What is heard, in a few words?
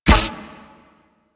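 Full Draw Mech, Weapons; Warframe; Infested; Inf Cernos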